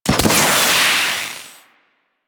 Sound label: Flying Spaceman Jetpack Burst; Warframe; Venus